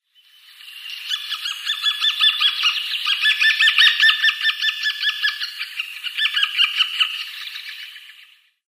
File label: White-backed Woodpecker nestlings